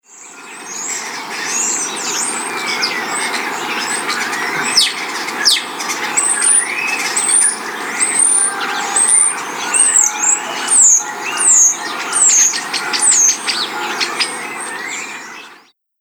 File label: Bird Song In Spring Coastal Town Ambience; Bird Sound Effects; Outside Ambience Sound Effect; Andrew Fleming